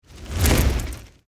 Torch Pass By, Fire, Torch, Pass, By, Heavy, Crackle, Whoosh; Flame Sound Effects; BlastWave